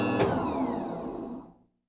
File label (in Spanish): plataforma movimiento final